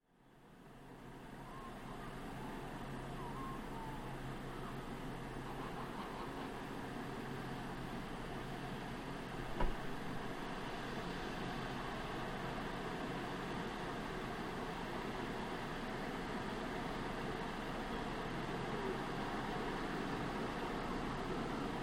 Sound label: Road Sweeper Truck up and past, cleaning street; Vehicles; Trucks